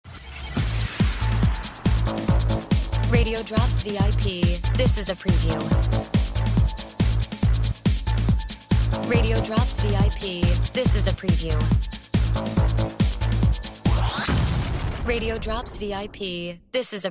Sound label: Music Bed